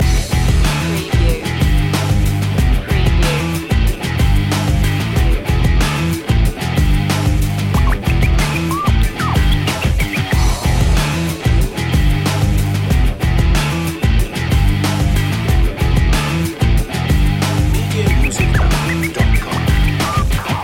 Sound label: no pain no gain loop